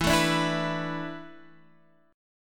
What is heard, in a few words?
E 7th Suspended 4th, Guitar, Standard; E7sus4 strummed; 12,12,12,9,12,x; JGuitar